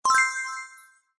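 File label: button bonus click; Golden Barnyard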